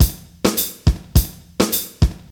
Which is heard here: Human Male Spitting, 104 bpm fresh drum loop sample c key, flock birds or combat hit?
104 bpm fresh drum loop sample c key